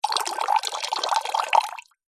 wine or water pour into 125 wine glass from slight height; food drink